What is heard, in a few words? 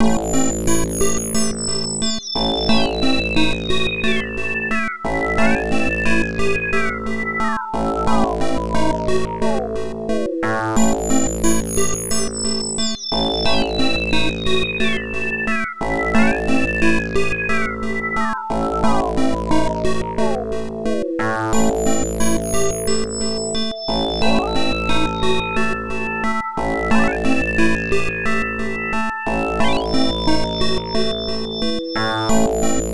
RPG - Dungeon